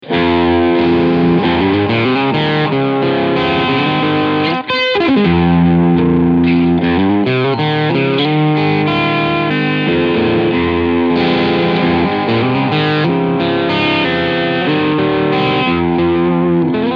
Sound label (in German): Crunch Steg